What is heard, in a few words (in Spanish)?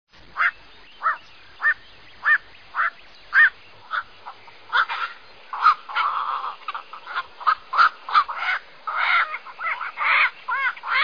canto Martinete